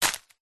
foley footstep single soft shoe forest floor dead leaves twigs; zapsplat